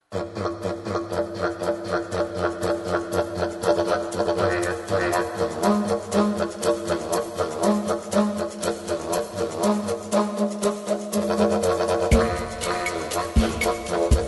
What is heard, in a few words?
Didge Madness